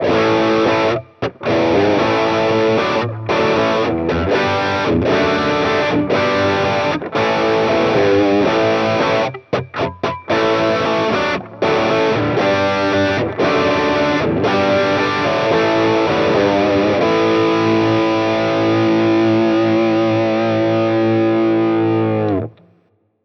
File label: Mooer Cab X2 Twinverb.B 2x12 left Twinverb.B 2x12 right Drive